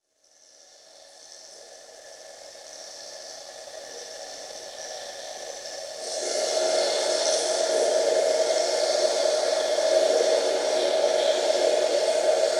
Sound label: Water Stream Splashes; Chalice Well